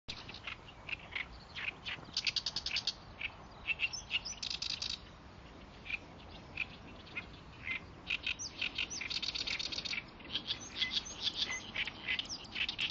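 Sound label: oyoshikiri, great reed warbler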